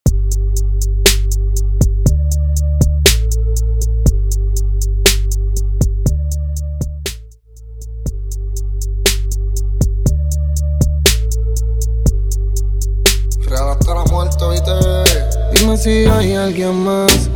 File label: Trans Trap To Reggaeton 120 To 92BPM; transition